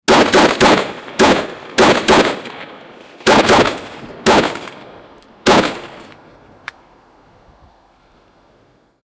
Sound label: A-10 warthog shooting abo, warfare